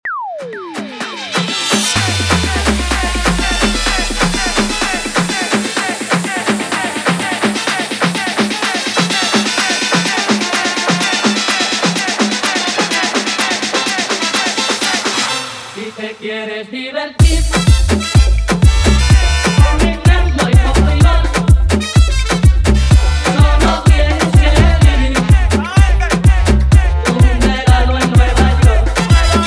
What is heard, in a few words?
intro outro 126 bpm